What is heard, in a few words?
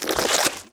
big, mob slime